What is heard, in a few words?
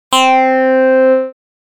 Jaw, synth lead